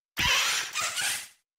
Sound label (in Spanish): Grito de Sizzlipede